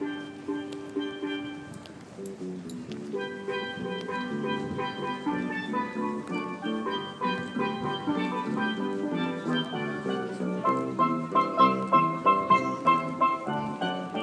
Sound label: busker steel drums